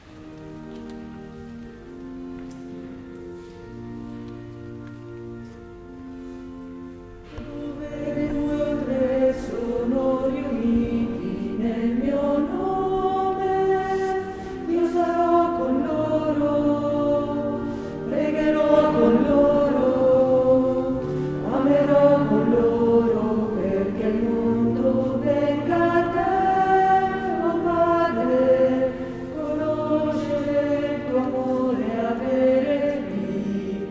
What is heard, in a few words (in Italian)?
canto ingresso